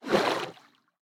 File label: Floor Sink; Boomerang Fu